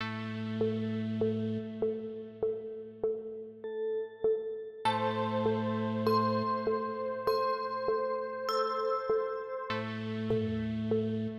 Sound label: Holy Waiting Hall